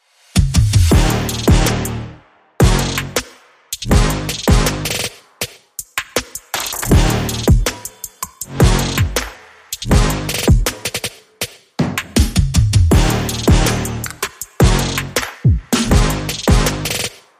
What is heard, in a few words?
Drums and Brass